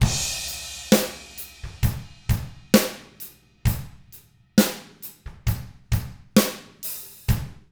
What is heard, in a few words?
SLOW ROCK, Drums; ILIO; Double Platinum Drums 1